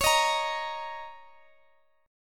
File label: Bb Minor Double Flat 5th Db